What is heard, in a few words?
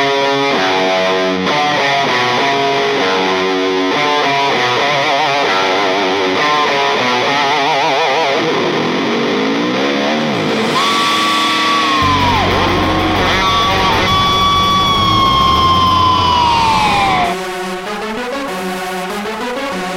guitar ringtones